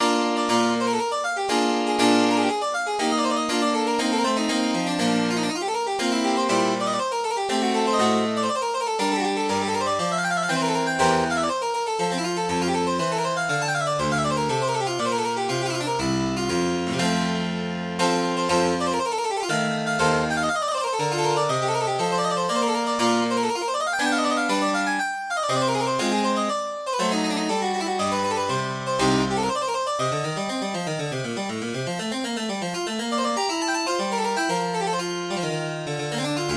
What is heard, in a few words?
Partita, piano